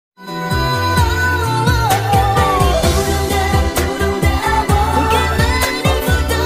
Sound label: music